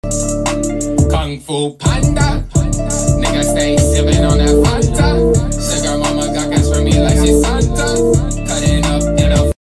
rap; kung fu panda

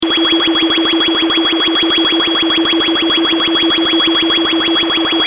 cyber alarms synth

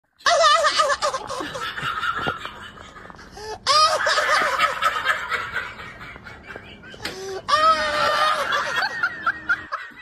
laugh funny

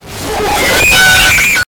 aaaaaaaahhh

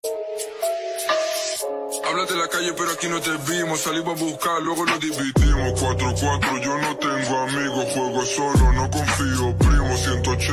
slowed ah ah ah

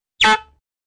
honk

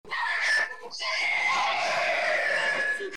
scream death

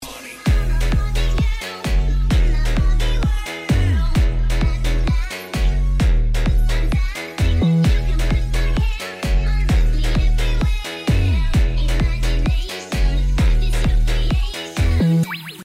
funk

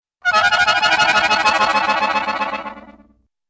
rapid concertina tremolo

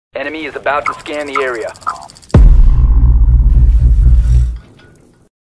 drone; r6 lion

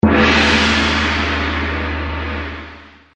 gong fx

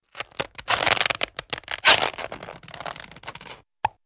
cotton glove stretches and pops off hand

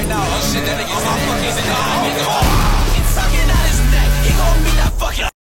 tiktok rahhhh

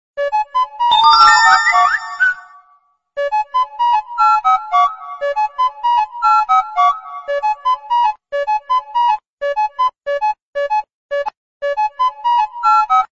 trap remix

